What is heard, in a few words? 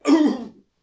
ouch; dolls House; bony